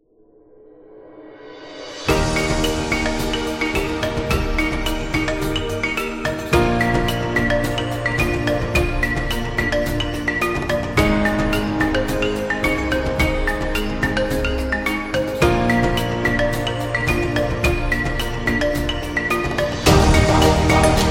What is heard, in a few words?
Corporate Africa